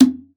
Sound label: Conga, Session Instruments; Best Service ProSamples vol.42